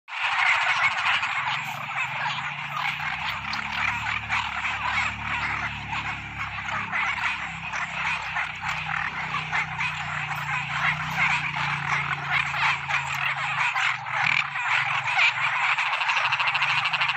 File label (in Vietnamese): tieng coc nhai keu vao buoi toi ban dem